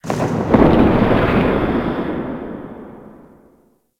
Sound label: Galleon Eerie Ambience; Warframe; Grineer Galleon